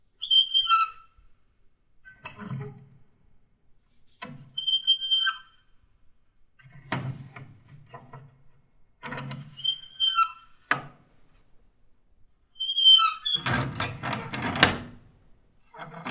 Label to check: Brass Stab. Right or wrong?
Wrong